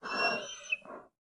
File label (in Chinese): 熊猫担忧声